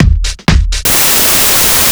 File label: drum loop 125, fatdrive, vocal house; PS-28 Vocal House Samples; drumloops